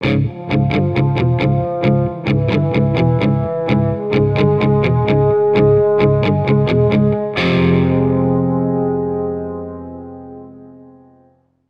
Bari Sax

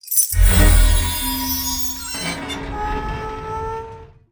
Crown Gate Open; super crazy rhythm castle

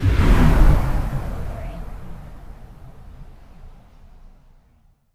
power blow whoosh

